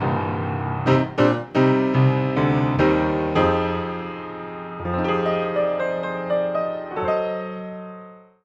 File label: Multimic Facets Piano XY Hi Strings